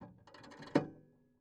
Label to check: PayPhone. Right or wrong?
Right